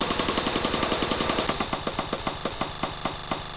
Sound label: STOP; Funhouse of Love